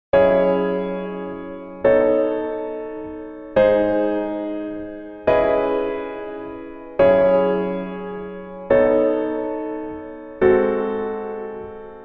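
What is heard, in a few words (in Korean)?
Piano version 여자키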